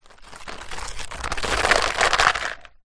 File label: vehicle car hubcap plastic land in road